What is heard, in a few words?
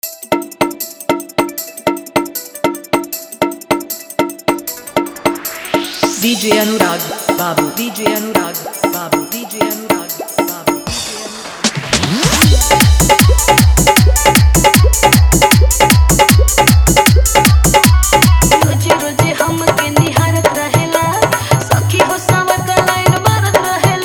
Full Dhollki Bass Mix